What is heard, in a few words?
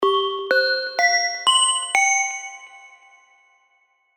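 synth, Atonal Bells; old skool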